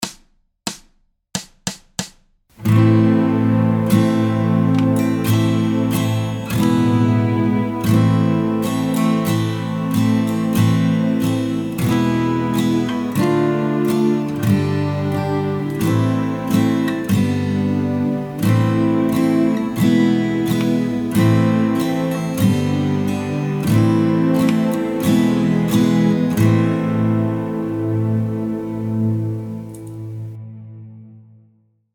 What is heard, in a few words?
Minus Guitar, Performance Backing Track; God Rest Ye Merry Gentlemen